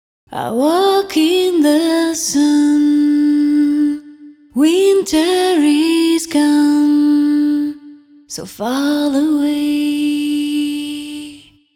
Female Voice Solo

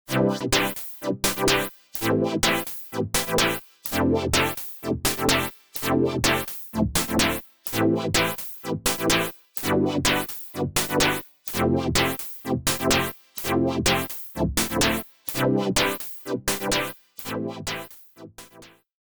Drums Filter Seq Wet; filters bundle; SEM

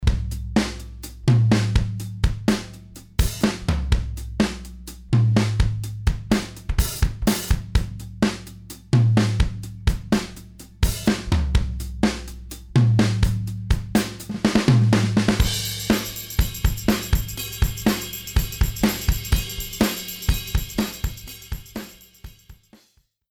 Drums Bus

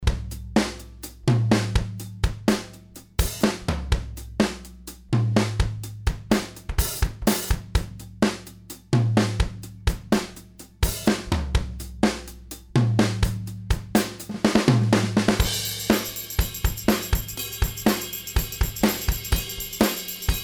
Drums Bus Dry

